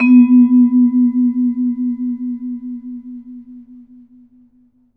VB VIFS B2, VB VIB FS; Best Service; Peter Siedlaczek's Advanced Orchestra

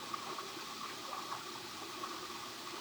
Waterway A Light

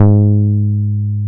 BAS MKS-50; Best Service Gigapack 2

